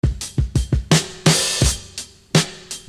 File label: Beat, Hip-Hop Street Beats; Construction Kit; Sonic Foundry (Sony Creative Software)